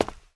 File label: concrete; firefight